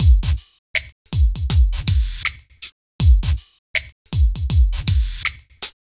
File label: Smooth, R&B Drums